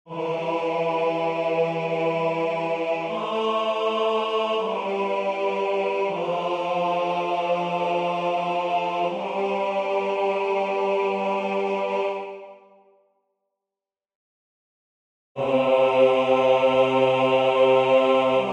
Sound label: basse; O bone Jesu; Ingegneri